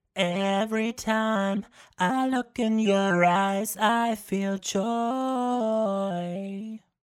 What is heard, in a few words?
Vocals Auto Motion; Minory